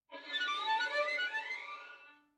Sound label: Violin Slide Up Down